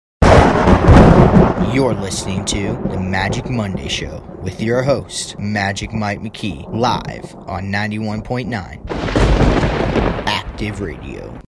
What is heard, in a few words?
Bump